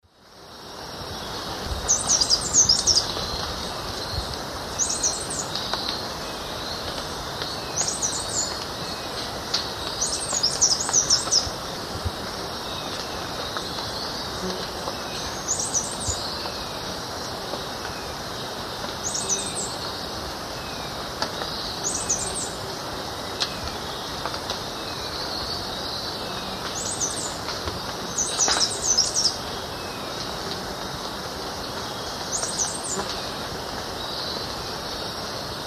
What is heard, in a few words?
Threnetes niger, song; S. America; Eastern Lowlands